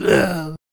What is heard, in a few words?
Stoner Death Vocals